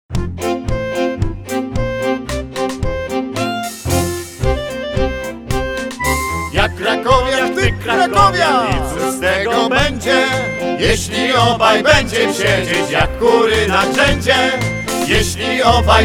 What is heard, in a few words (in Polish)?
krakowiak